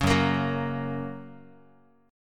F sharp Major, Guitar; strummed; 4A,4E,5A,5D,5G#,6C#; 4,2,1,4,x,x; JGuitar

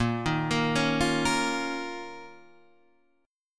Eb 7th Suspended 2nd Bb

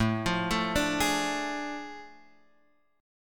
A Major 7th Suspended 4th Sharp 5th

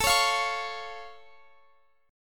A Augmented A down Guitar Standard; strummed; capo=9

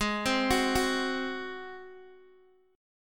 Ab 7th Ab down Guitar Open D

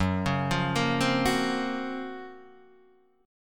F sharp Major Sharp 11th, Guitar DADGAD

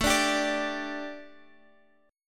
A 7th Suspended 4th A, Guitar Half Step Down

